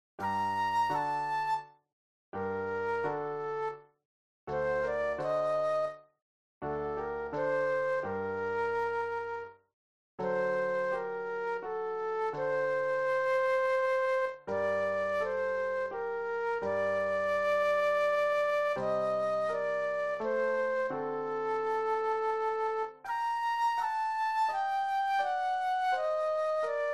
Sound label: Flute Solo; classical